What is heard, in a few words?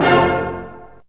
triad